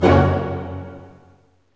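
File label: orchestra hit major; String Master Series; Dynamic Orch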